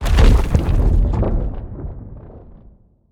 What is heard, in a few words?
Trap Crumble; Scraprun; Deathrun